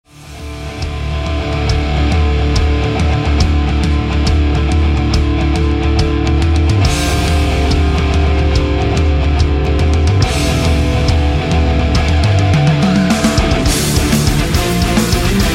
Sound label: rock